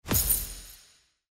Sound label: Reward Claim weekly